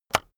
keyboard external enter release heavy

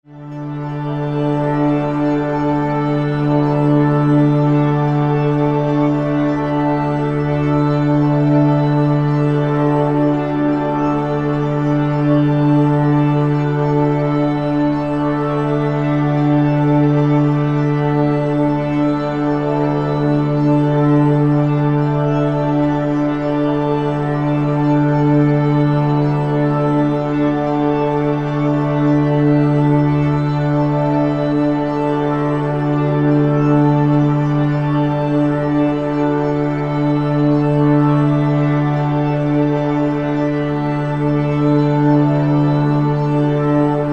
fluffy breeze

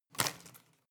home plant leaves rustle hit

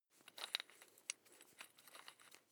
electric drill bit unfasten wrench turn

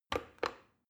plastic button start click regular slow

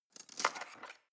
cutlery teaspoon drawer scrape

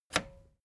record player play push

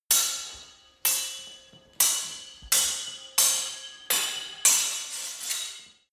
sword hit sword sequence

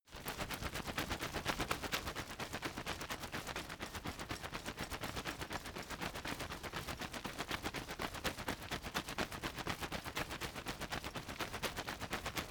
umbrella big shake fast soft

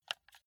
console controller arrow key press slow

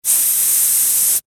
bathroom spray

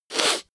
sniff female

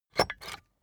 puncher squeak punch bulky

